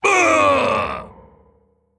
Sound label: Male Mogu Death